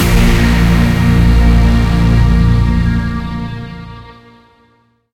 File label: stinger; Mirror's Edge (2008)